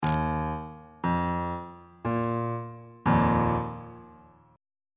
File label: e flat suspended 2nd chord